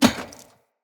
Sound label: Heavy Grunt Boot Walk Metal, Footsteps; Enemy; Sinecure